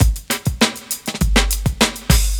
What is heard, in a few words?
Dance Mega Hip Hop Electro